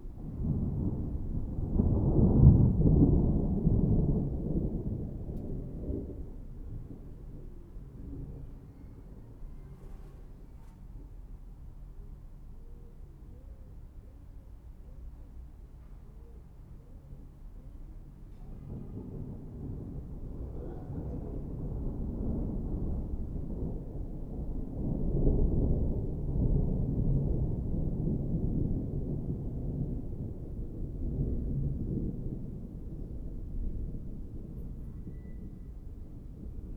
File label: distant thunder; 99Sounds; Rain And Thunder